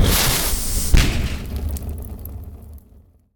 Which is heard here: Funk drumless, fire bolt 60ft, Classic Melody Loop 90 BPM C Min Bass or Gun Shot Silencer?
fire bolt 60ft